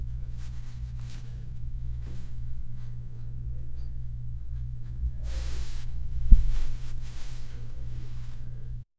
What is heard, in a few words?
a cloth dropping on a; objects